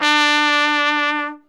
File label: SOLI TRP, Alpine Volksmusik TROMPETEN; Best Service